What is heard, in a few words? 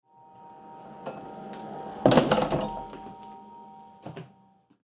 front door